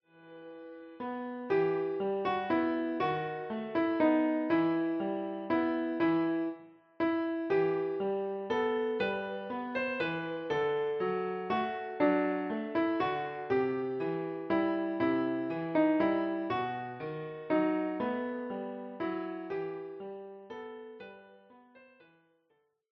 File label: What child is this Kl